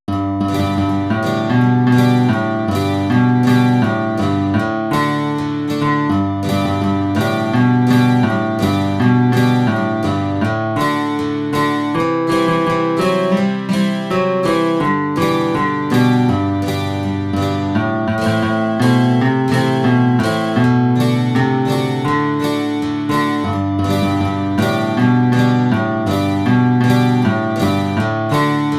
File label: Major Scales